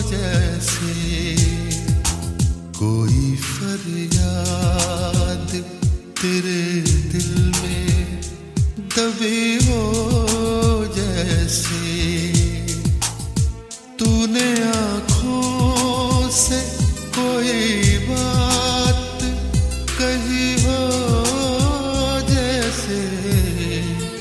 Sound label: bollywood song ringtone